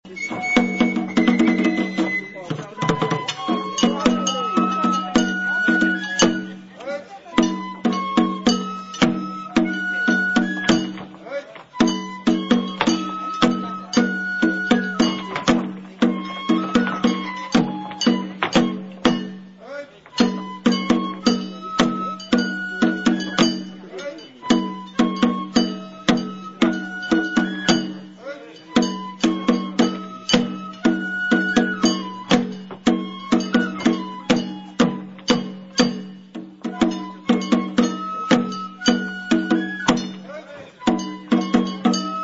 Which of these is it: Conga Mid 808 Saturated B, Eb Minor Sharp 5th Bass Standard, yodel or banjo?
banjo